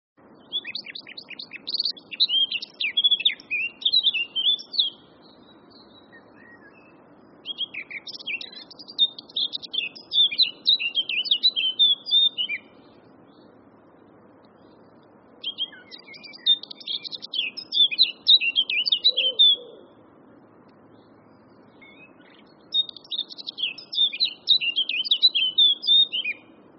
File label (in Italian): capinera